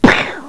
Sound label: SHOT